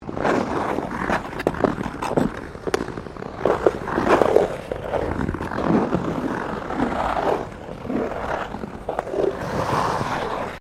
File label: Ice skating Sequence, Figure skating; Sports